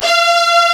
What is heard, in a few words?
A#4, STRGS; East West; Global Sounds